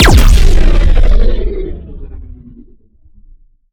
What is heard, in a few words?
Shot Large Laser Electron Cannon